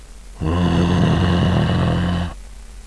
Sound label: harrrrr, zombie; brainbread